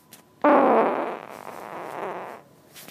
Frustrated, fart

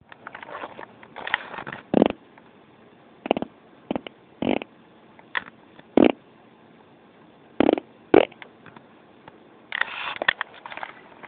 recording, fart

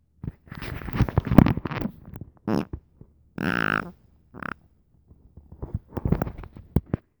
Voice, fart

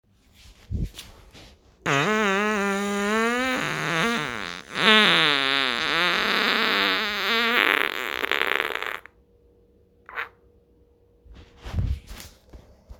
thunderous fart; FartShare

